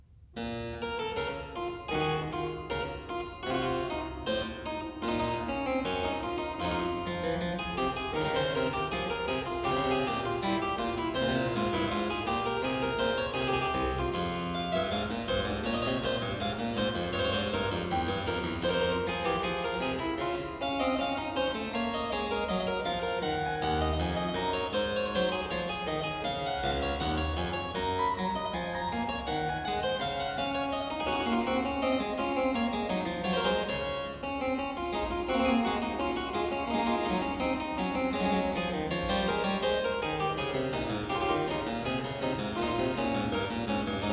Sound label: Zweistimmige Inventionen in B minor, Keyboard Works; BWV 786; J.S. Bach; Inventionen & Sinfonias